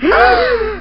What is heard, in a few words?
gasp